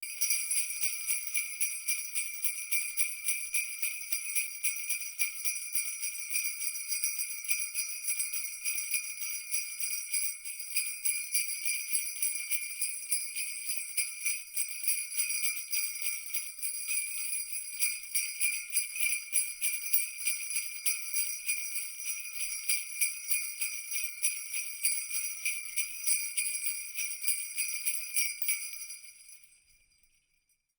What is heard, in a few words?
jingle bells